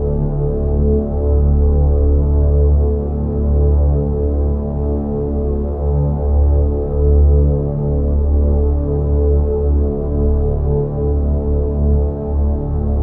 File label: Samsung Galaxy A12 Notification Ringtone; (India)